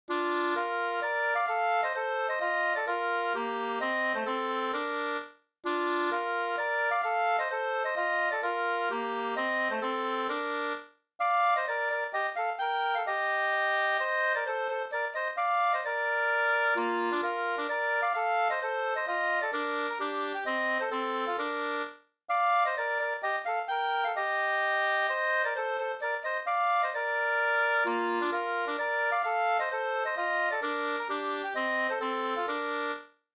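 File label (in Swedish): polska, tvåstämmig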